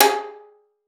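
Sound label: violin pizzicato G#4; Xsample VOL-5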